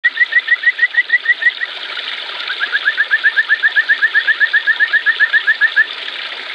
Bufotes luristanicus

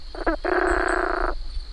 Bokermannohyla circumdata

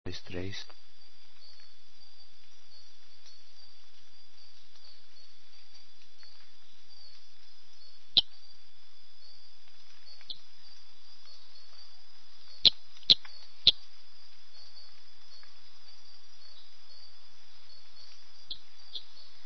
Ischnocnema bolbodactyla

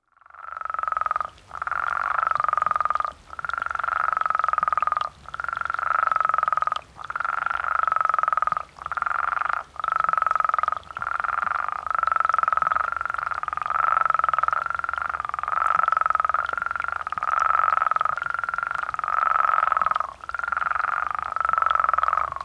Spea multiplicata

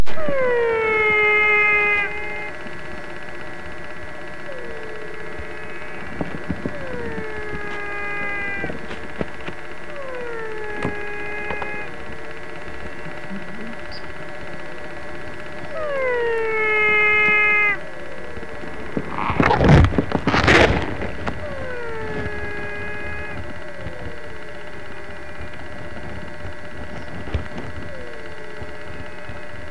Physalaemus barrioi

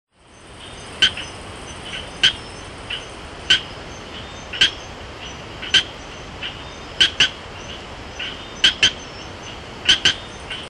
Gephyromantis leucomaculatus

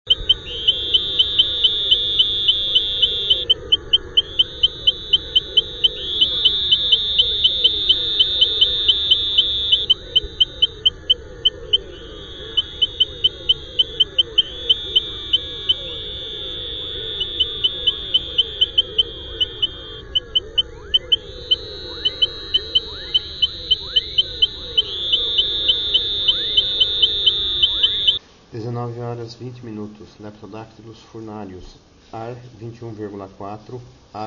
Leptodactylus furnarius

